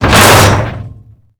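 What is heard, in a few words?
debris metal medium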